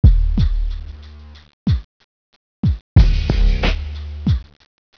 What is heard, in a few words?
Backdrums; SmallGame